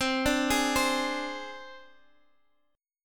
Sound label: C Suspended 2nd Sharp 5th, down; Csus2#5; arpeggiated